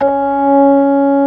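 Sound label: ORG. C#4; Best Service; Advanced Media Trax Modern Composer; B3 FULL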